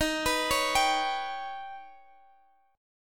Eb Augmented 7th Eb down; arpeggiated; Eb+7

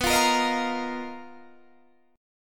B Major 7th Flat 5th B down Guitar DADGAD

